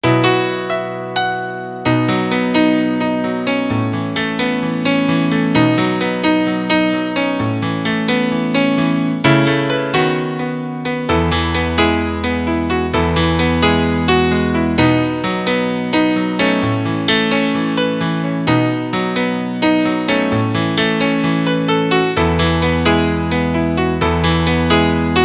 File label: Piano; Unaerp